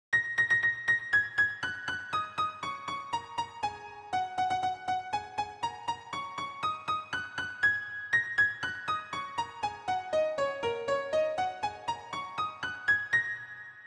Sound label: asian piano